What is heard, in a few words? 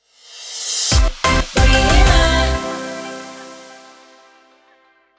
Jingle Sting; Rhema